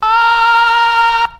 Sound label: "oh" SUNG, Voice; ooh ahh